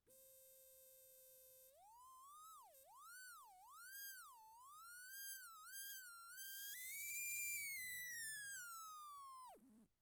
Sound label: motor Burn